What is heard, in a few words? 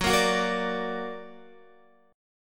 Gb Augmented Guitar; x,9,10,11,10,10; Gb+ strummed